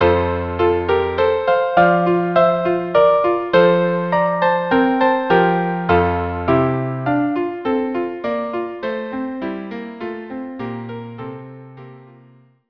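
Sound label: violin piano organ; Blessed Spirits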